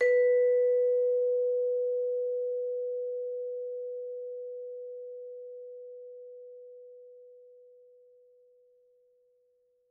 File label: Vibes soft B3, Vibraphone, Soft Mallets; Struck Idiophones